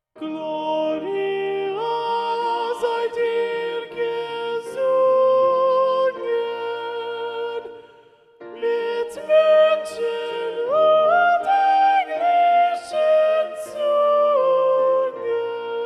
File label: Soprano Predominant, SATB; Cantata 140 - Bach; Gloria sei dir gesungen (Wachet auf, BWV 140); J.S. Bach